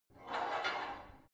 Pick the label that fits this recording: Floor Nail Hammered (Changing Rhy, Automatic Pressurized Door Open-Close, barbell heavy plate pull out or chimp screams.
barbell heavy plate pull out